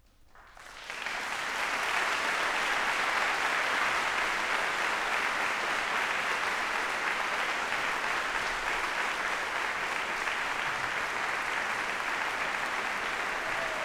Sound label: Applause